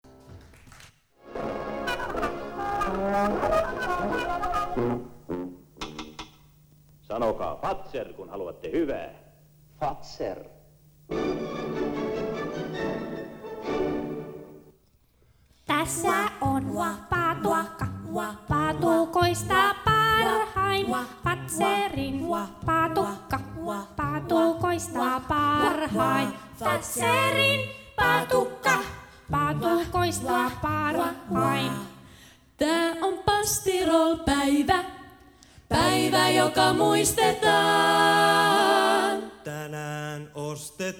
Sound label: Karkki acapella; Mainosmusiikki